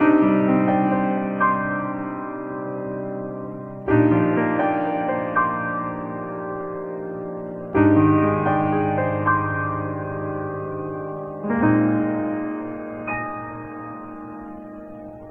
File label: piano, Sad Loops